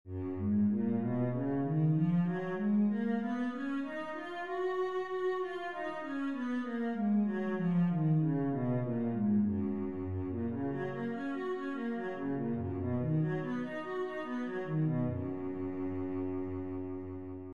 f sharp major, cello

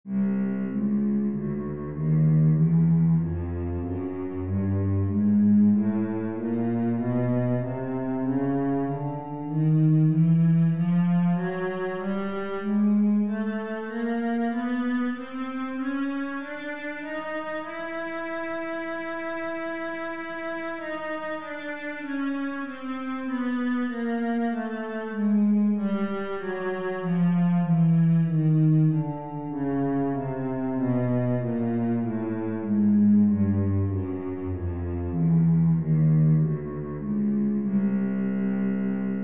chromatic scale, cello

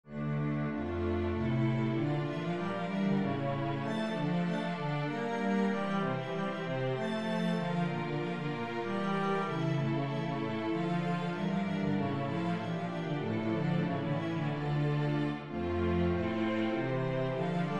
rondeau, cello

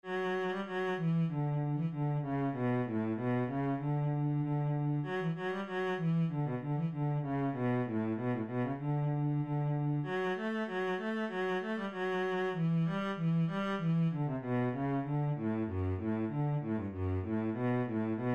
Kemp cello

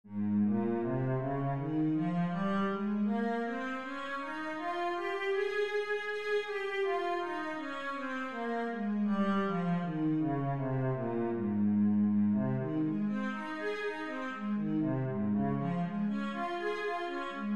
a flat major, cello

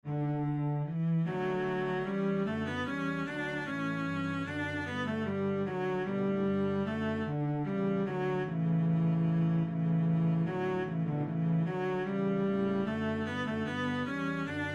minuet, cello

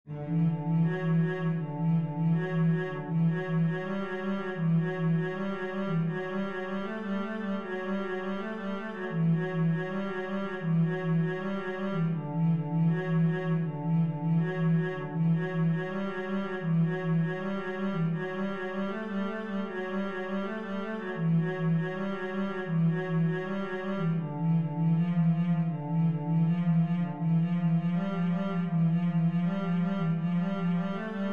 etude, cello

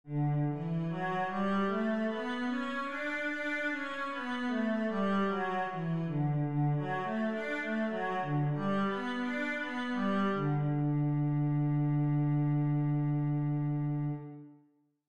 cello D major scale

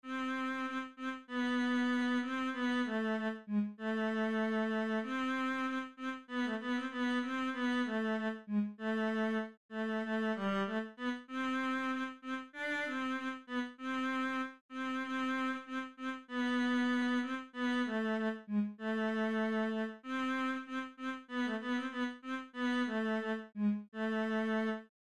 Helas cello

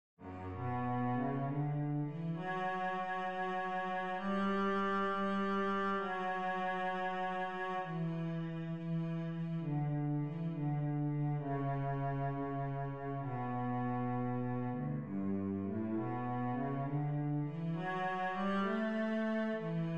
cello; moldau

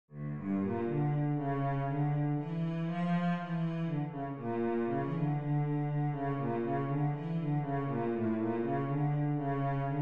bulgarish cello

